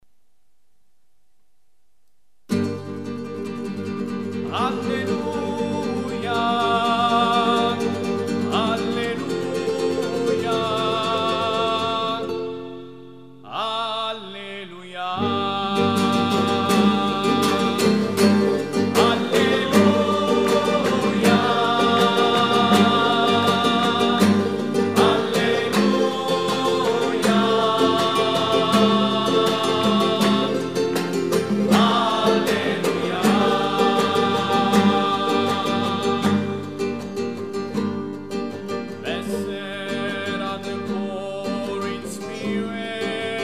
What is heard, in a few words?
Alleluia Gospel Acclamation